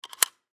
Go Kart Seat Belt, Go Kart Seat Belt Buckle Electric; Go-Carts; Sports; Blastwave